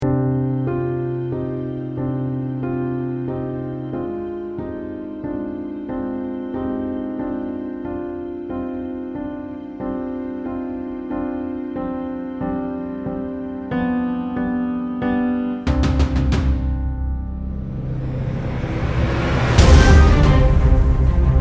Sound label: Sad State Epic